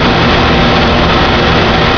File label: atmospheres; PC Gamer IT CD 41; Daikatana